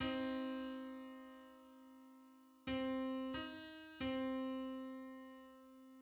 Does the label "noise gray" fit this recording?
No